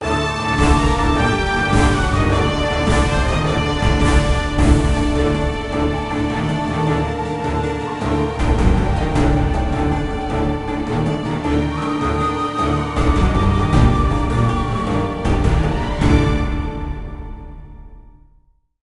Sting Egypt Battle; Age of Empires Mythologies; NDS

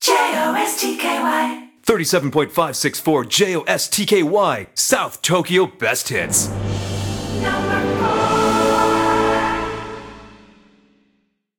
Radio Jingle; let it die

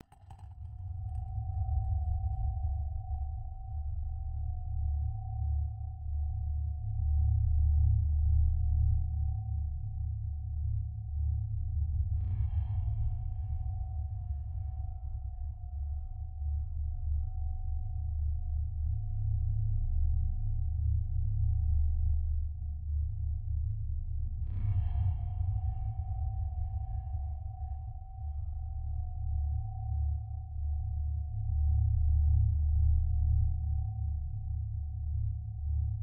ATMOS dark endscape; 39steps

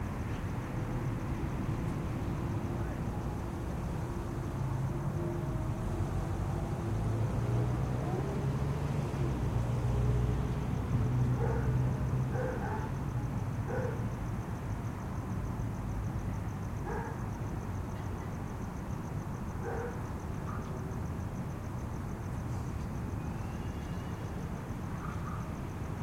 Ambience Evening; hello neighbor pre-alpha alpha 1

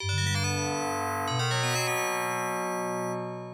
Potion; prince of persia; ibm pc at

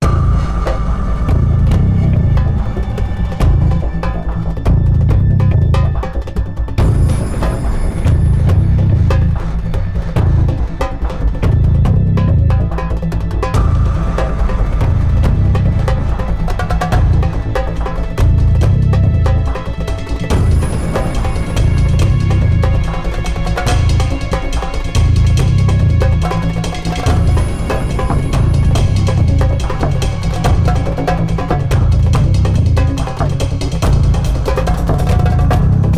stealth busted

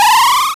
Charge

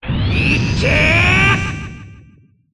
sudden impact; goemon

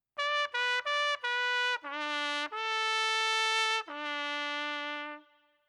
Trumpets

